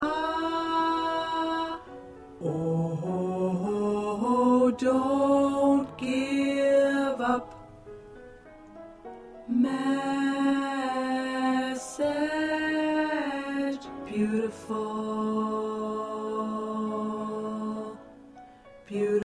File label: Tenor 2